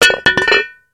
metal lid; cooking

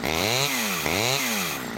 Chainsaw, chainsaw revving

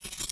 scissors cut, hair

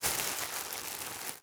plastic bag crease

